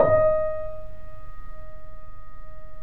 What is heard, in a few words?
The Piano, D#; InVision Interactive - The Piano; BOESINGSOFT